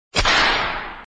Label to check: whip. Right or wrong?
Right